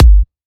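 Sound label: Kick, G minor; Sample Banks; Percussion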